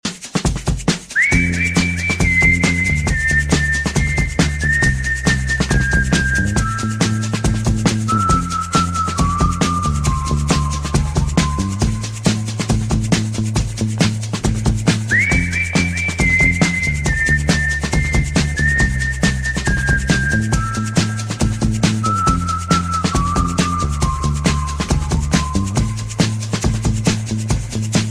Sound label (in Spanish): Silbidos; Cuestion De Sexo